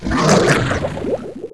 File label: drown; alienarena